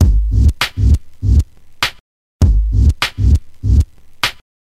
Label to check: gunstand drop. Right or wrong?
Wrong